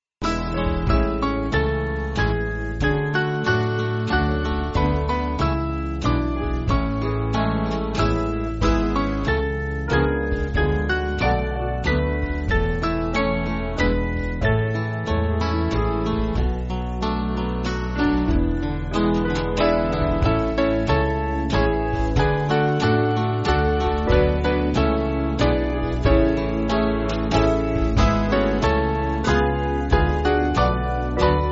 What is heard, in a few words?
Band, church music